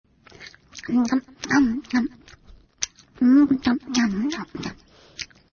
drinking

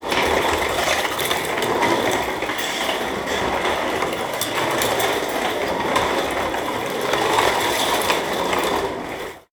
dragging box on rolling wheels

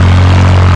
Vehicle Aircraft Loop

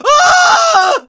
Scared Male

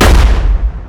Bang tower Attack

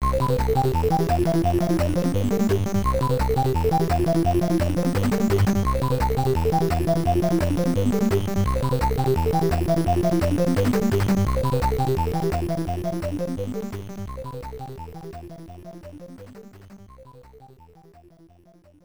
Roulette Bgm; Super Medal Fighters; arcade

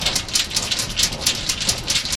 Chain raise

